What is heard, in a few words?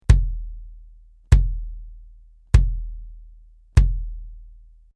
BASS Reso Hard Plastic; evans; naciagi do centrali rezonujacy